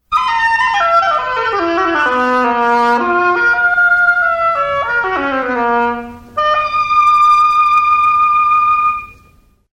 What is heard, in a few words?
oboe